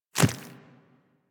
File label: Infested Small Walk Default, Footsteps; Warframe